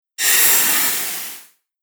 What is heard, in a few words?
Whoosh Full Speed Ahead; Whoosh Sound Effects